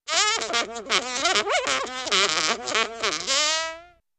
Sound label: Funny Fly Buzz Talk